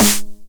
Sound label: 909 SD Tape Sat